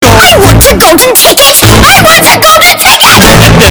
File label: earrape; veruca salt